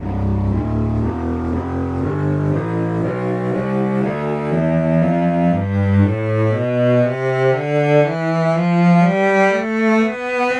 cello tonleiter

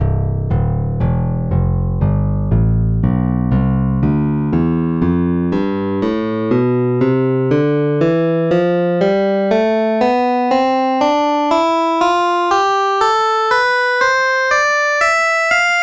picked bass; tonleiter

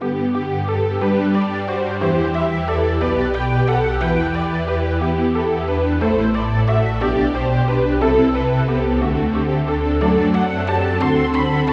polysynth

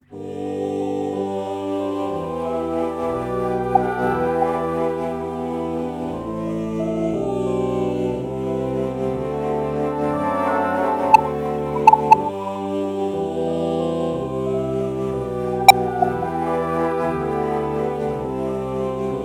alto flute oh morph

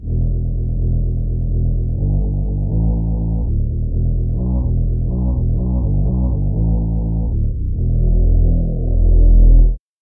halo pad bass

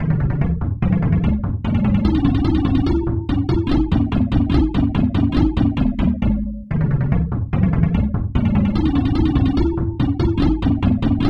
taiko drum

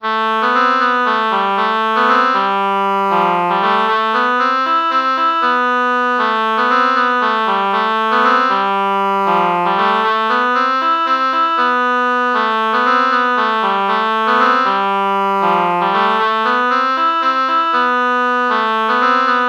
oboe oboe lpc true melody morph low

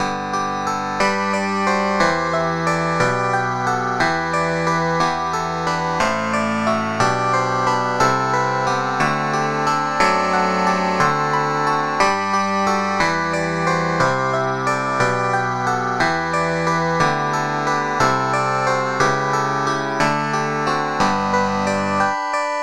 clavinet; SpectMorph Evaluation #4

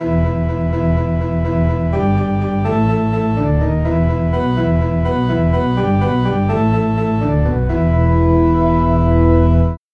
church organ bass

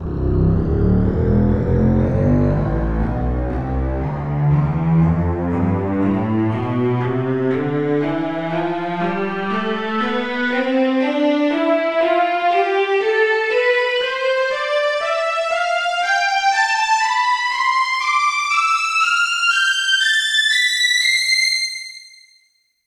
strings; tonleiter

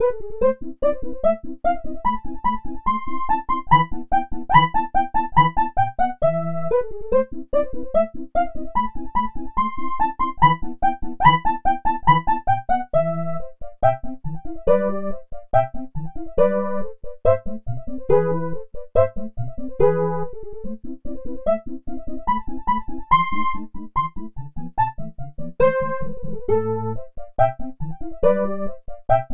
chiffer lead

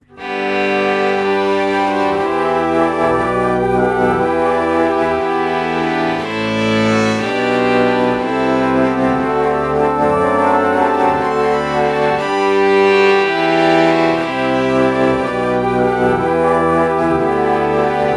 alto flute violin morph

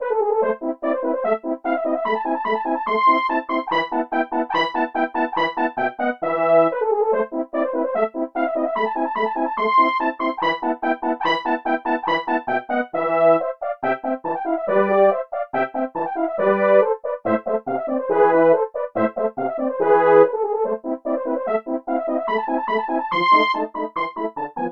french horns

